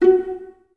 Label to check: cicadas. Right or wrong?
Wrong